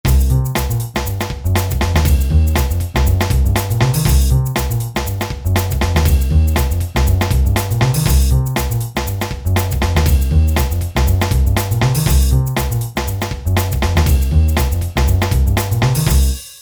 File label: Rap Hip Hop